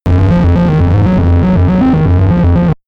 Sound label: game arcade underworld